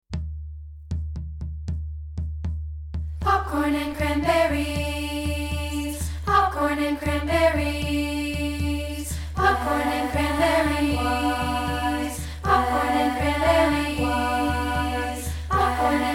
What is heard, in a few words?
A Cappella